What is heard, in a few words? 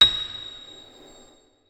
notes, keyboard piano; Hideout Furniture